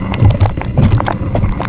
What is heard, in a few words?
big ball move; Bounce Touch